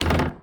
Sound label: Street Ball Glass Back; SFX Kit; Street Basketball